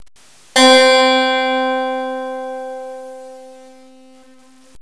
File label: Harpsichord; sound analysis; sound instruments